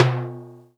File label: Roland R-8 Low Timbale